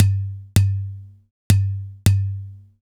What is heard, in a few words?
Udu; Sonic Foundry (Sony Creative Software); Joe Vitale Latin Percussion